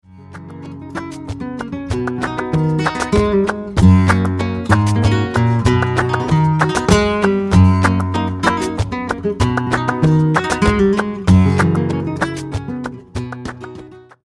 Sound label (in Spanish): guajiras